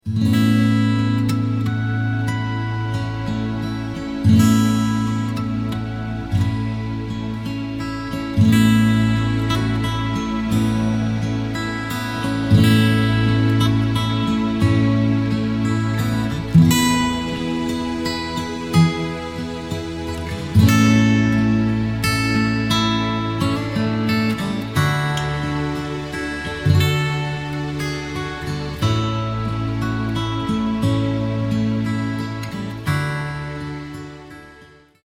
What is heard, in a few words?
instrumental